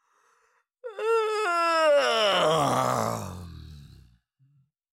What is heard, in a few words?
Cartoon lion voice yawn